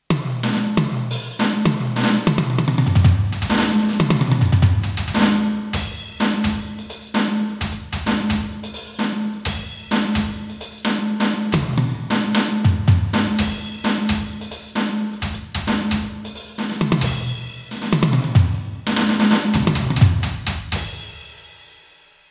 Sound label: ALESIS Nitro Max Kit Reverb ON